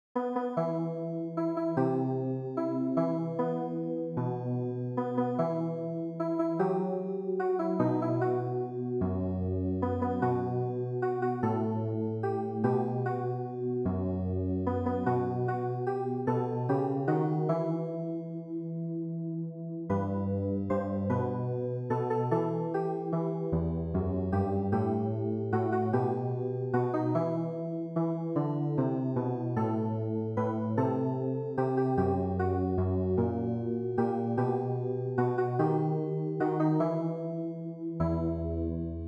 CLAVIER; Il est bon de louer

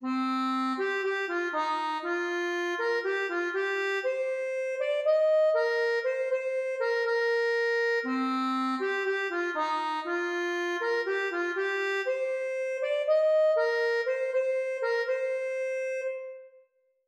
ACCORDEON; ALLELUIA colombiere

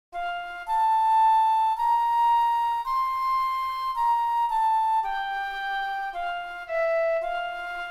FLUTE; Psaume127

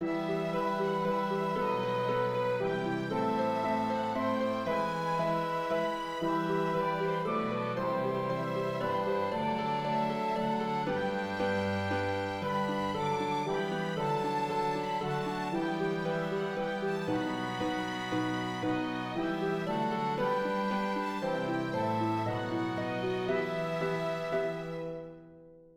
ALLELUIA ORCHESTRE; ALLELUIA de Schütz

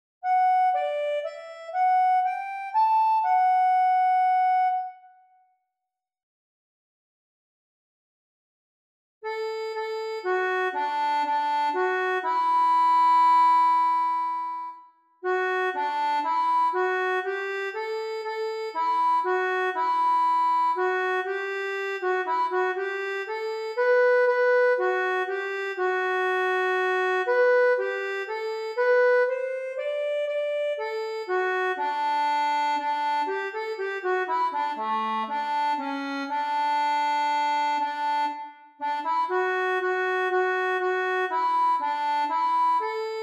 ACCORDEON

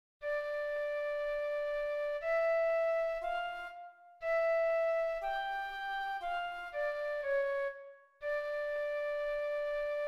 SANCTUS FLUTE; St Jean

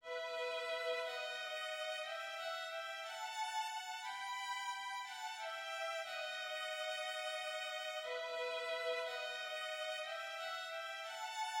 SANCTUS VIOLONS

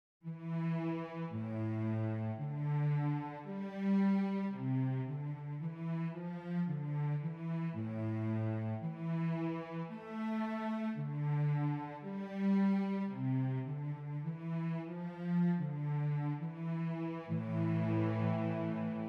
VIOLONCELLE; DOXOLOGIE solennelle

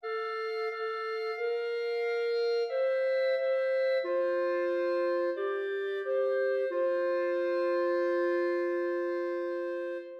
CLARINETTES

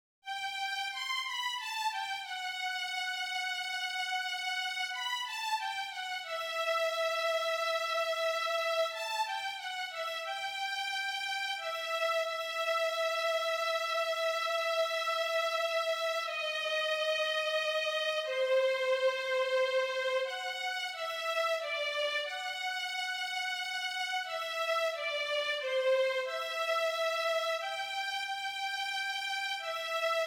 VIOLON; violons

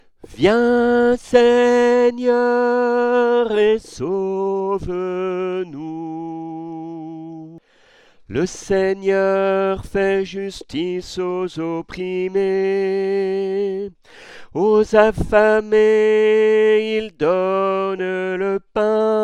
CHANT

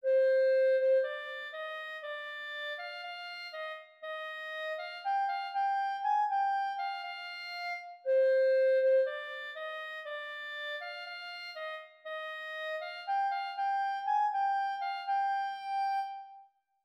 CLARINETTE; ALLELUIA colombiere

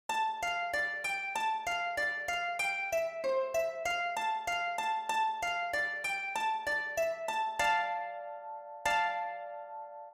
KYRIE HARPE; St Jean

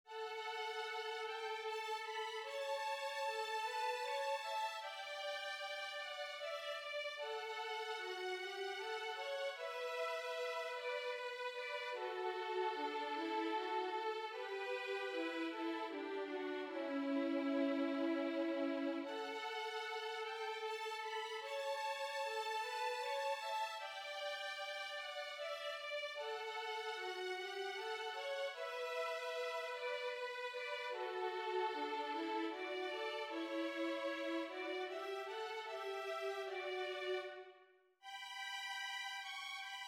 VIOLONS

